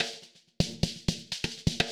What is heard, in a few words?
mix percussion loops, 125; Vocal House; PS-28 Vocal House Samples